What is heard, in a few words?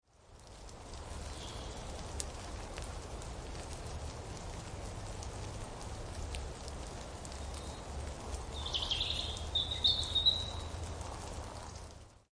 rain drizzle forest; Zoo and Nature